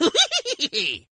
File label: laugh sound; Scooby-doo